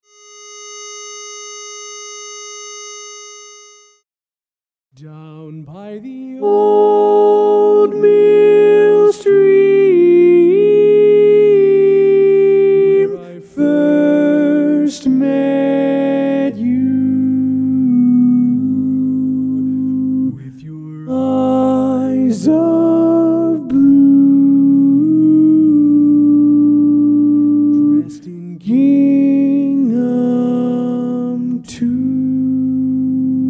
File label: Tenor